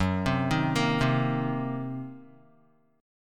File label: Fsharp 6th Flat 5th down Guitar; F#6b5; arpeggiated; JGuitar